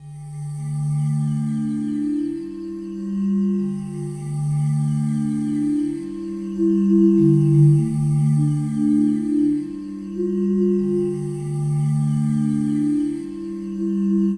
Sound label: Batur Walk, Ubud Loop; Sonic Foundry (Sony Creative Software) - Friendly Fire